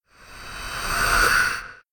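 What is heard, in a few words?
giant cheshire spawn; monster; Senillinea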